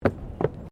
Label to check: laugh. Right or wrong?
Wrong